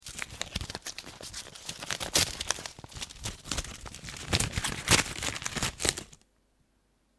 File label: Opening Letter, Tearing open of envelope.; Paper; Materials